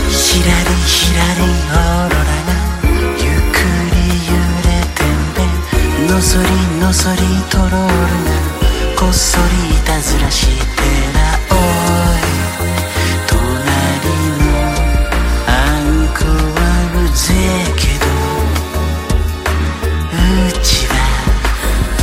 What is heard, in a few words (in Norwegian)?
ny musikk ringetone